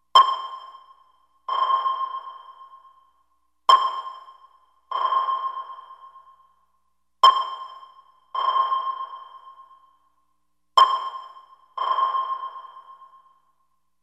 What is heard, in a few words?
Submarine, sonnerie